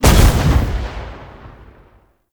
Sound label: Spear Gun Shot Proj Explosion; Hoplite